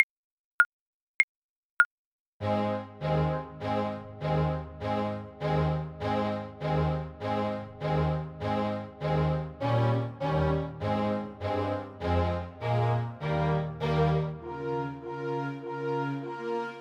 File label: Tenor Saxophone